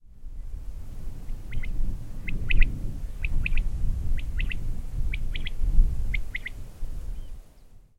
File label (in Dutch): Kwartel; Boudewijnskerke